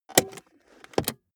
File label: car bmw e46 interior handbrake release